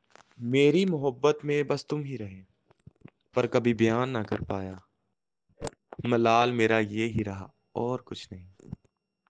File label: mee vocals